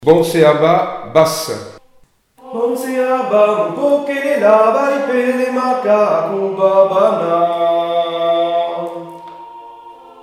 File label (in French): chant basse; Bonse Aba